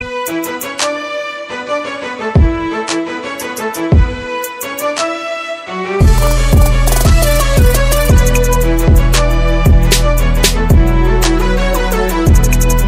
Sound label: Video Game Ringtones